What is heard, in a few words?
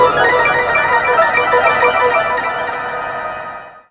wheel; weapons; dota; ringmaster